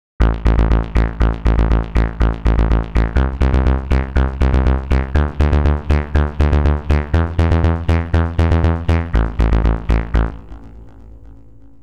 gate 16 steps deep rhythm; the meditation soundset

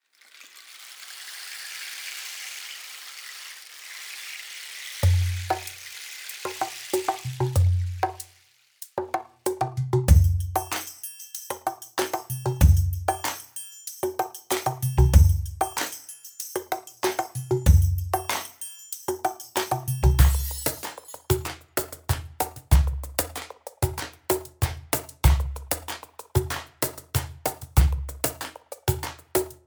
world percussion; gorangrooves; handy drums

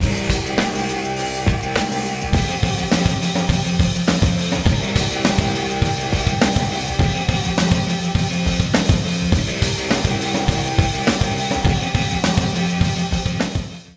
tremphatic guitar; Wire Grind

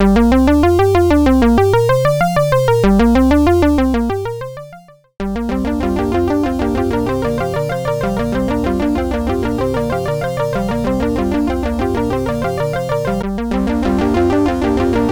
delay arp 3 delay modes

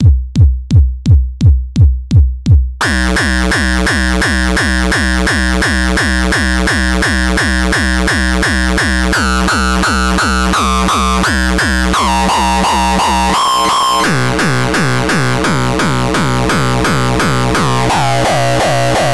bd909 disto; distoblast by audioblast

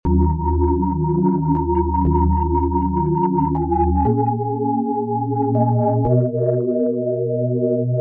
sustained sine chord; foxfire; chiral audio

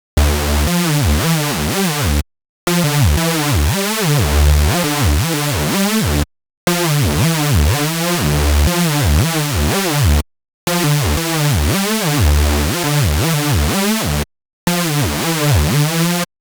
saw synth

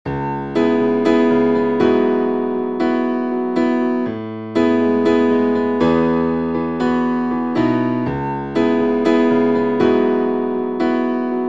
foxfire piano neighborhoods; foxfire by chiral audio

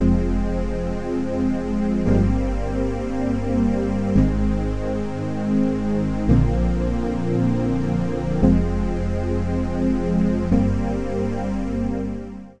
pwm fast; orbinator; audiodev blog

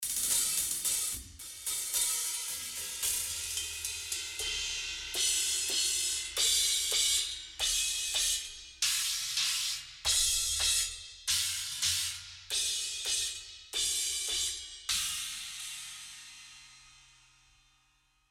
cymbals; dani loeble impact; klangmacht

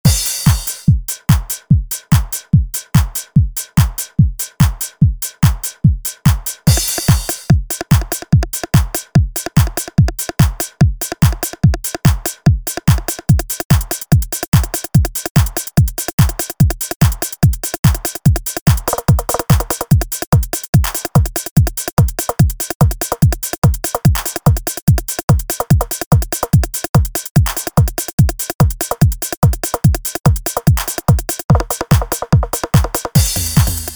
d909 kit; superwave

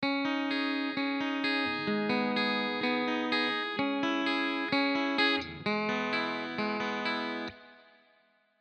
strato wet arpe; Chiqui Audio; Arpeggio 39 128 Bpm C Min; Fender Stratocaster

